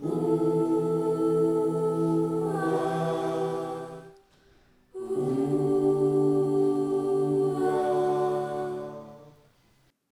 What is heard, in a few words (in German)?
Chor